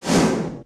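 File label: Collision Interior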